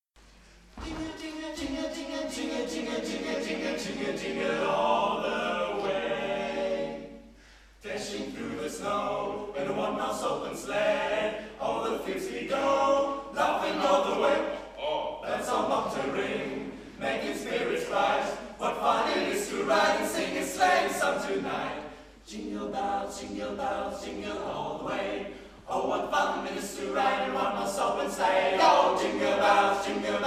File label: Jingle Bells, Jugendkammerchor; Jugendkammerchor Ahrensburg; Weihnachtskonzert